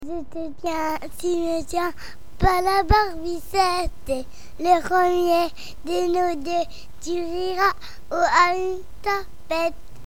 barbichette

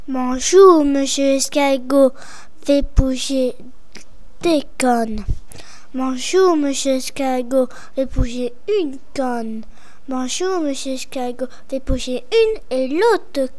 Bonjour mr l escargot

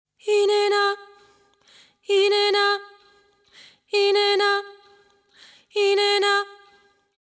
chant voix

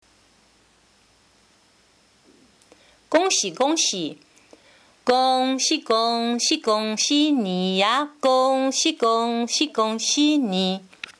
refrain chant

